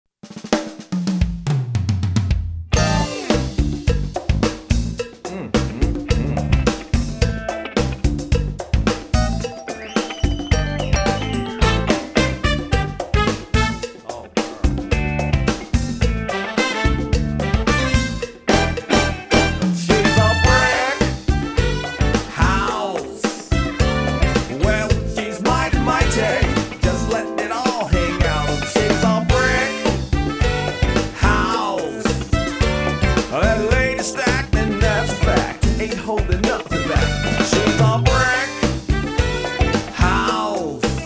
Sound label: Variety Band; Atlanta